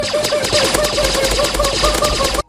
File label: laser compilation; pewdiepie